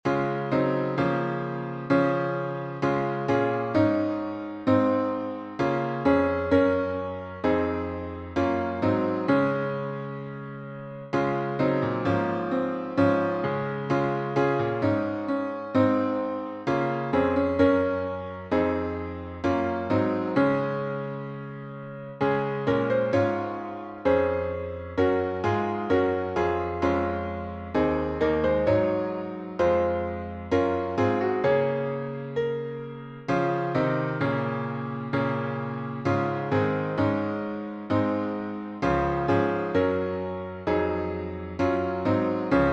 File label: piano synth; Little Free Music Library